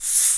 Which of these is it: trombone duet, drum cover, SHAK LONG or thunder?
SHAK LONG